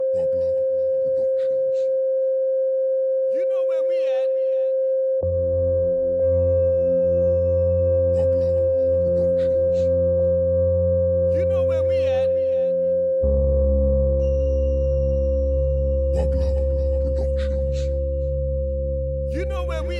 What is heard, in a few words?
Reggae Dancehall